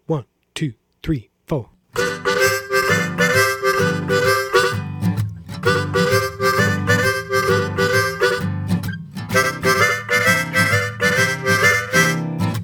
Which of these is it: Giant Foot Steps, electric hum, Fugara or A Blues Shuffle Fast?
A Blues Shuffle Fast